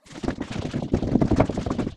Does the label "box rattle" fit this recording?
Yes